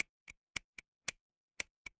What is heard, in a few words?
ui typing